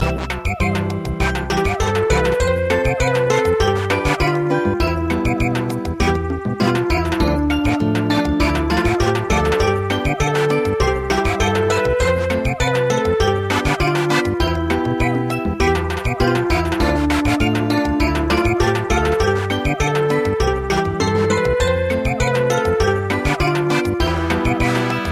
Samba, midi; Good evening Kings

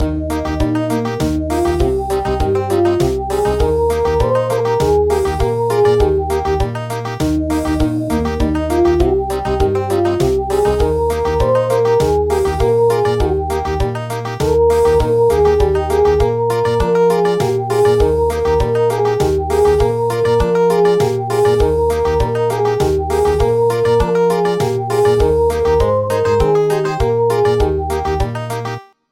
Country, midi; Heaven and Earth Rejoice Today

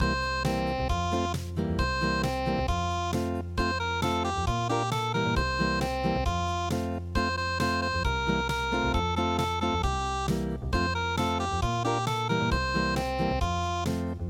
unpluged; Hot Cross Buns

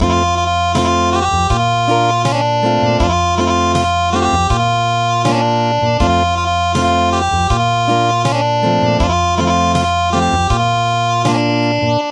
Acoustic; Ljulja ljulja ljuska

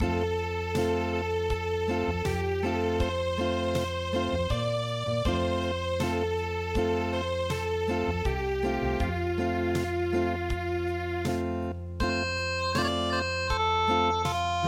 Acoustic; Cradle Song

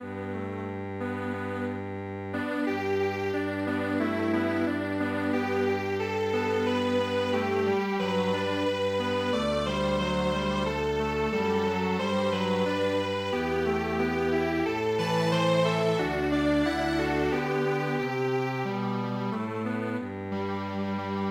Strings Quartet

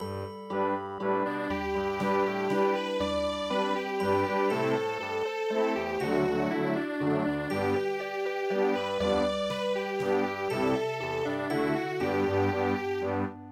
here we go round the mulberry bush vienna waltz

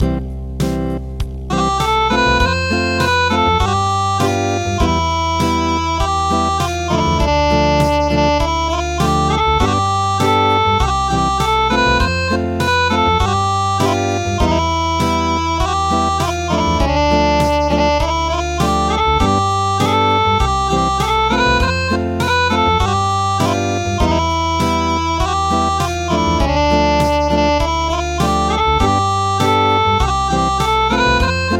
Acoustic; Good evening Kings

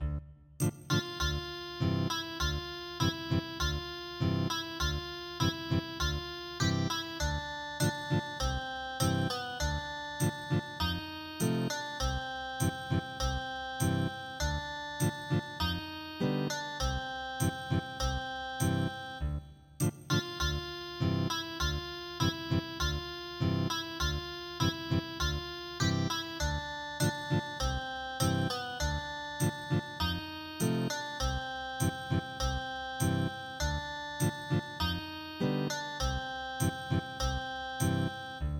Ethnic Guitar; Rouba

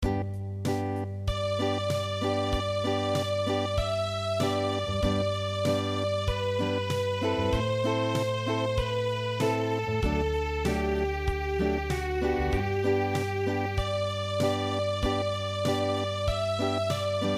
Es ist ein Ros entsprungen Unplugged

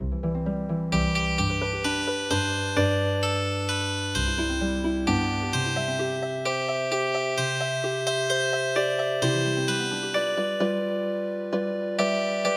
Healing Harp

